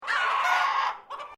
BIRD CHICKENS JAPANESE SQUWARKING, Chickens from Northern Japan; Animals